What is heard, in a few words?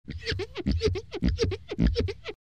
Glass friction creak